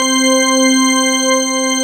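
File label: full organ C4; FULLORGN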